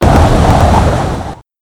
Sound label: hit wind